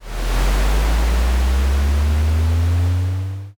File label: rocket launch